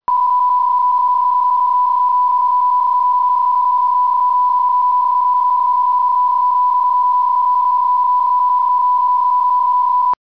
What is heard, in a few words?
PSKFEC31; MULTIPSK